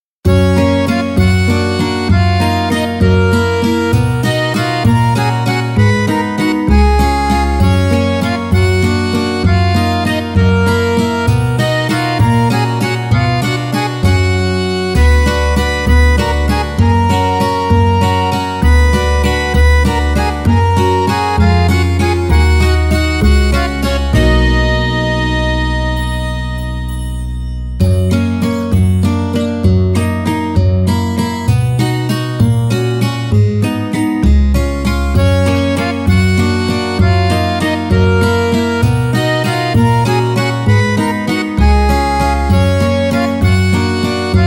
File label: bgm acoustic; maou